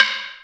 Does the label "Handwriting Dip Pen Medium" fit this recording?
No